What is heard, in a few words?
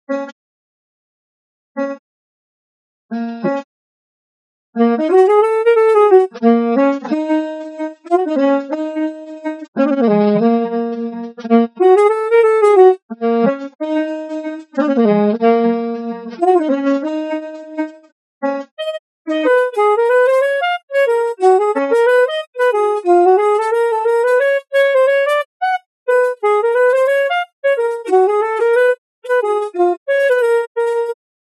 solo